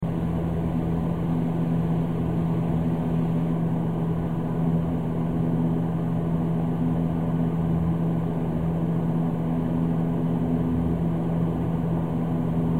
possible howls

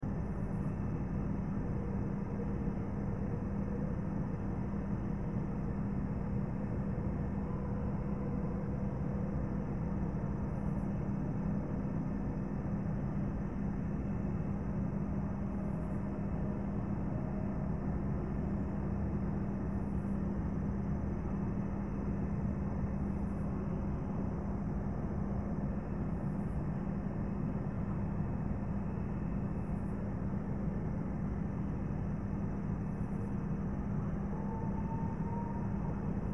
possible vocal

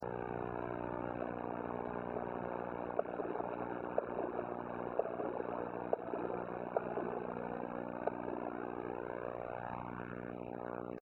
wood knocks

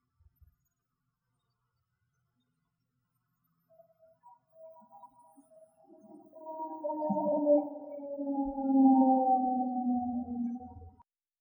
possible ohio howl; cooks run rd

